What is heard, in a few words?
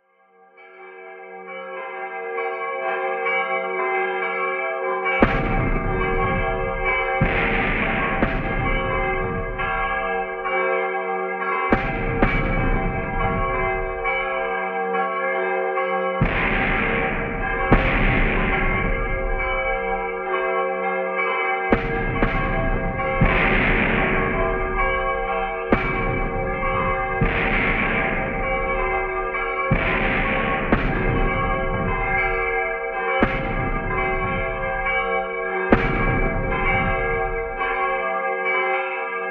cannon kane